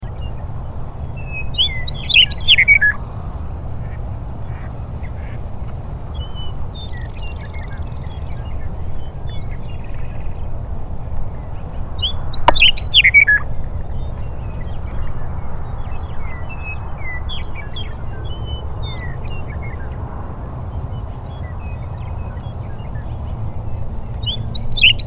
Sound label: Western Meadowlark